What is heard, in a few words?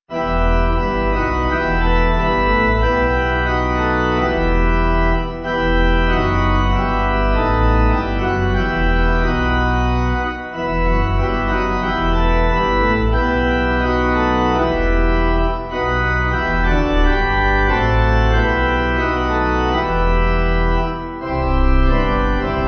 Saviour When In Dust, Pipe; Aberystwyth